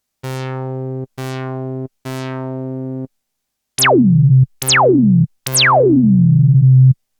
Marion Systems MSR2 Max Filter Resonance 12dB vs 24dB